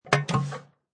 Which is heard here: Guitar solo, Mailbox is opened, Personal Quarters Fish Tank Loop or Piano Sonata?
Mailbox is opened